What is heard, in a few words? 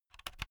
insert usb laptop unsteady, elektronik; objekte